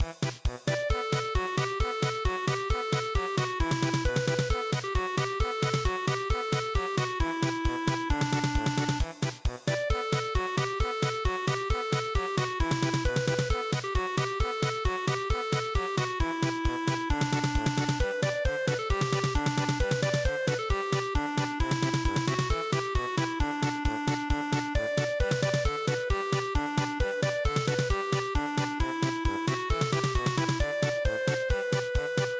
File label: polka; Karinka